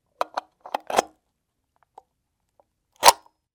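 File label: socket making sounds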